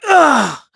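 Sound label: Vox Damage, Korean; KaselB; kingsraid; voices heroes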